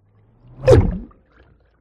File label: Cinematic Underwater Whoosh